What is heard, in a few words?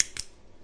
lighter click; cigarette lighter